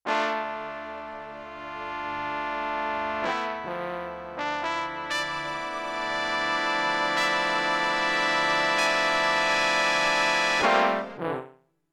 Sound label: String Brass; Europop Performance 1